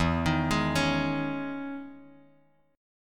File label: E Major 13th down Bass Standard; EM13 arpeggiated; JGuitar